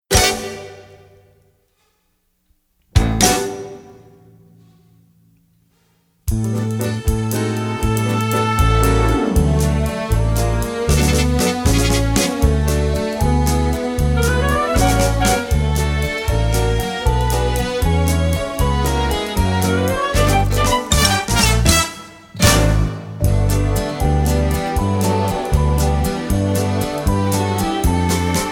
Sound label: instrumental